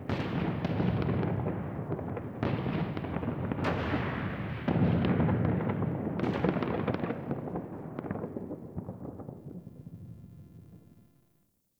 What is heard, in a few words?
Ambient Distant Explosions; Warframe; Nineteen Ninety Nine